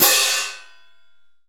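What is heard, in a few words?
CHOKED, CONCERT CYMBAL; Northstar - New Gold VOL-2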